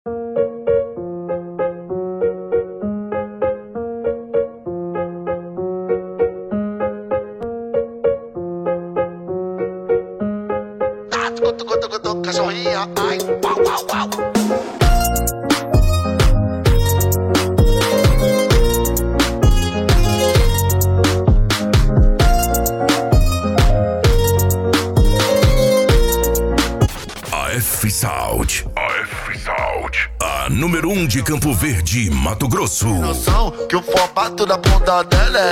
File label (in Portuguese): esp funk automotivo; afsound